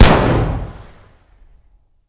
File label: fire dist int pistol; gmod; arccw uc commons attachments